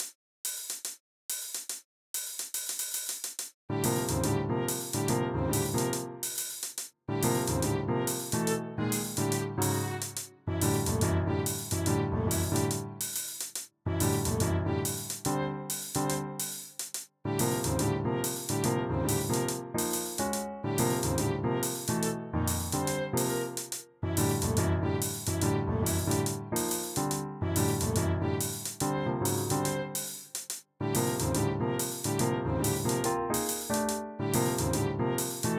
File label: menu music; super snuvi